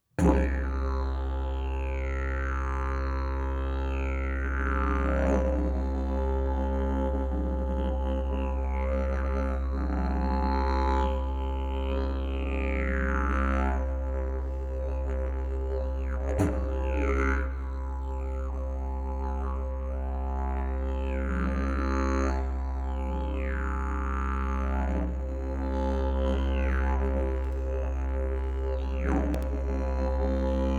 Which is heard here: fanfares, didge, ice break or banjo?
didge